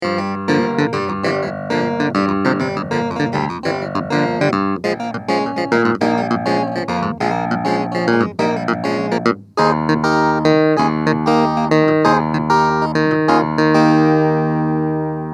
Hohner Clavinet D6 Pickup DB

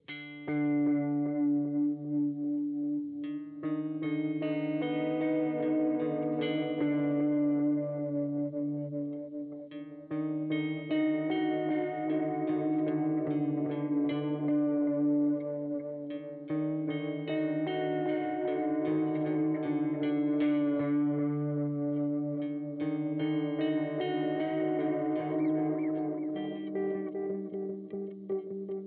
Fairfield Circuitry Meet Maude Modulation

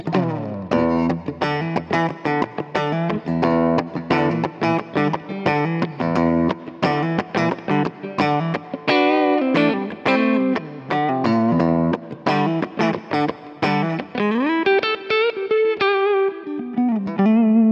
Compressor; Walrus Audio Mira

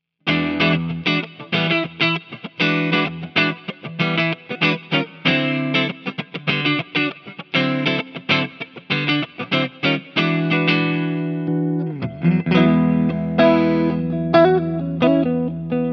DNAfx Clean Basic DNAfx; Quad Cortex vs. DNAfx

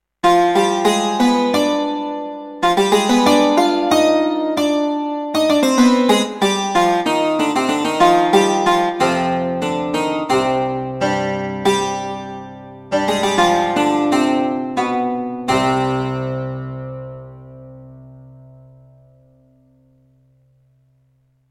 Saz polished

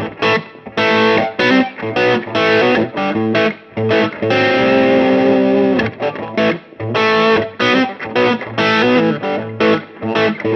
Blues Solo; Harley Benton DNAfx GiT Pro

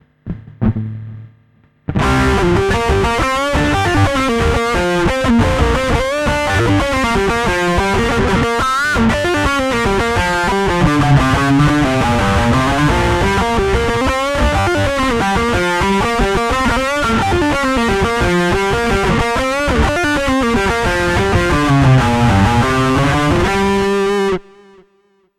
rock neck

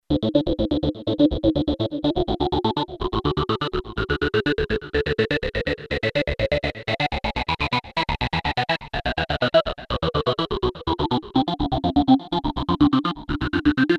Analog Osc Formant Filter Pattern; RPG8

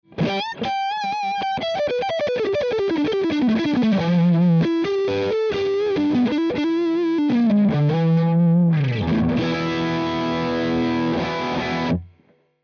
Lead Torpedo Orange 2x12

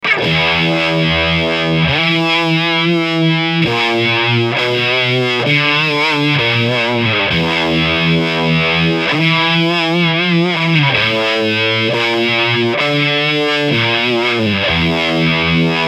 Mode Vintage Amp Overdrive

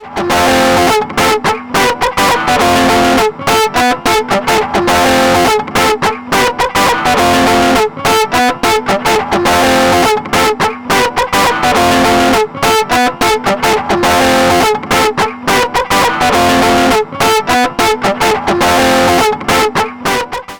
Ableton Pedal FUZZ Gain75 Sub1

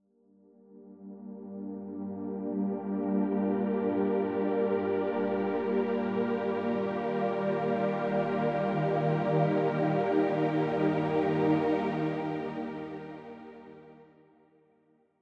Pads; Nucleus

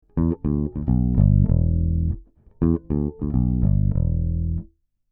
Premier 73 DI Bass 45dB Gain; Golden Age

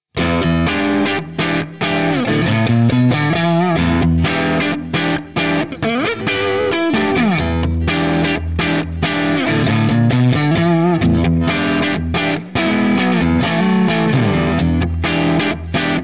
Epiphone Les Paul Neck Split Crunch

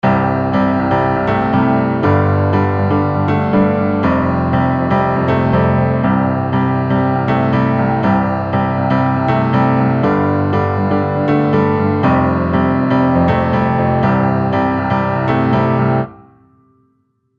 EZkeys Upright Piano Synth Pop Raw Tweaks

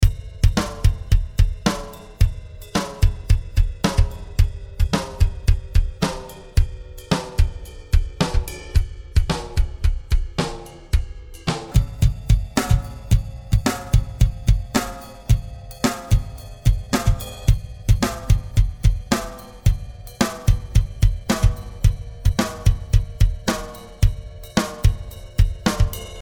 80s Pop Beat Vari Pitch

